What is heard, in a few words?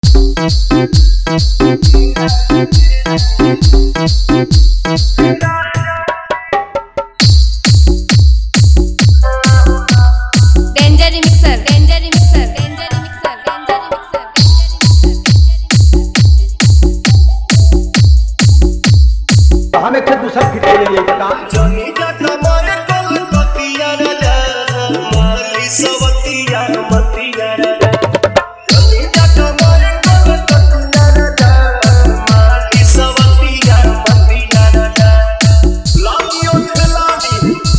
Bhojpuri Song Hard Bass Totali Mix